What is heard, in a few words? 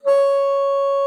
bassoon, C#5; Xsample VOL-6